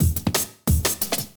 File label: breakcore breaks